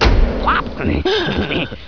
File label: menu